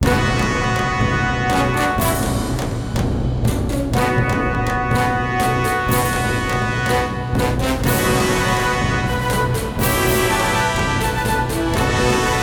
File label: Battle; kult